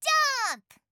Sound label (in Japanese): 攻撃